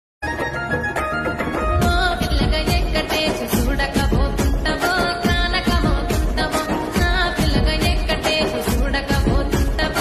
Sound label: dance music